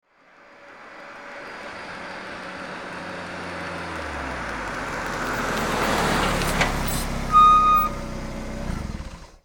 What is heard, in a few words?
Auto Compact Pull Up